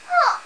MOAN

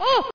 squeek; pong

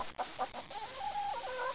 chicken

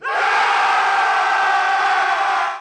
charge

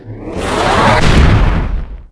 Empty Recycle Bin; 250 Desktop Themes; Quake II

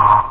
Horn; Sneech

